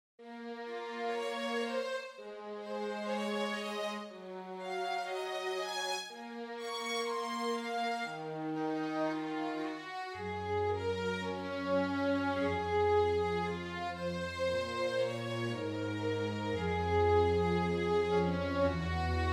Sample Strings Dry

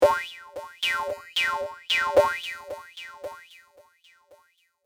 steeldrum

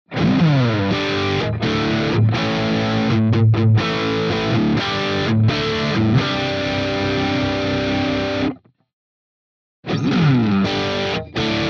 JACKSON AS Virtuoso SHP Rhythm Riff

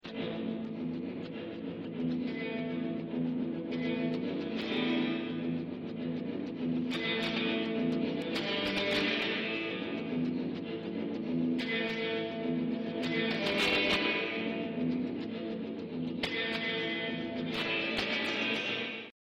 SD59 Hotrail Country Twang

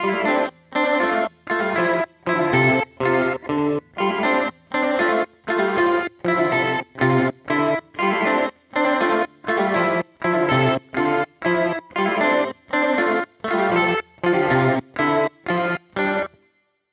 Rainger FX Reverb X

